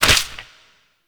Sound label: Boon Cancel Loop End; Warframe; Warframes; Koumei